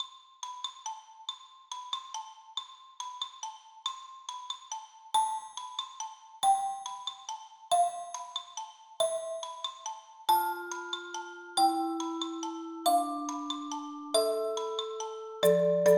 Carol of the bells Percussion; Singing Bell

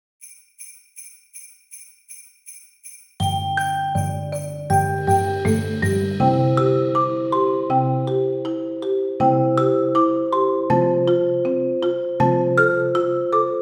Jingle Bells Percussion; Singing Bell

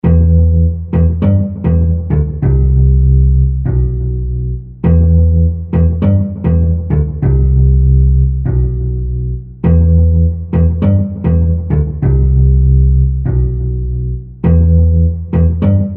Bass Backing Track

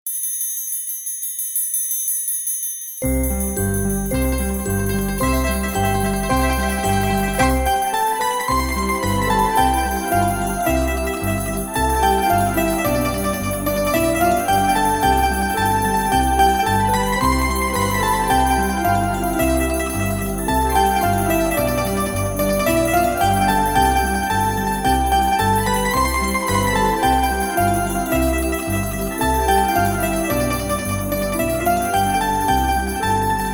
with triangles; Kalin Esperan Arxontes; Singing Bell

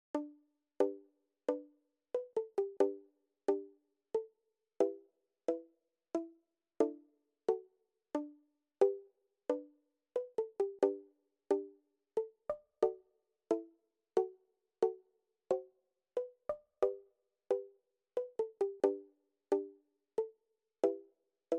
Boomwhackers; Amazing Grace; Singing bell

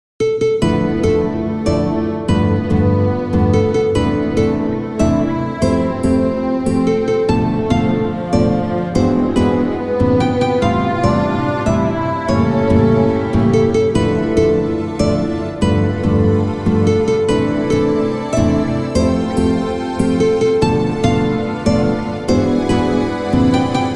Happy Birthday to You Bossa Nova Version; Singing Bell

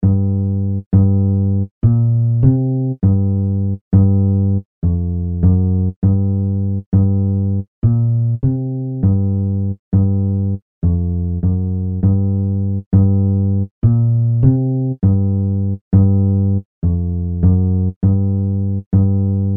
Bass Backing Track

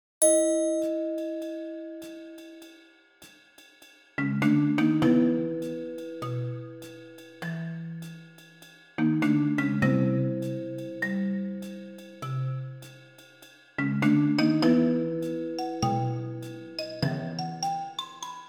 Singing Percussion Singing Bell

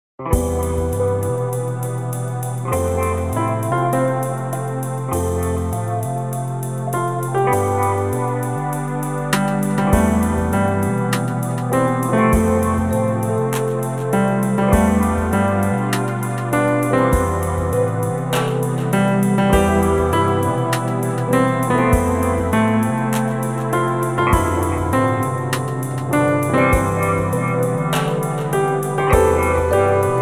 Happy Birthday to You Chill Out Version; karaoke; Singing Bell

